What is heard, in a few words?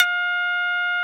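Shamisen A3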